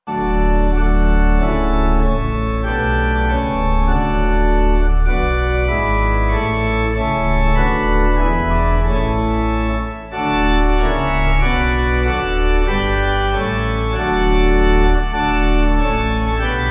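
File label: Pipe Organ, Music Backing Files for Churches; Life Of Ages; Culbach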